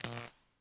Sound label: bad zap